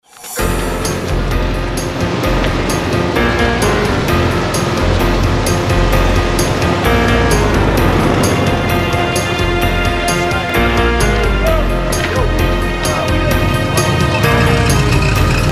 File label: summer groove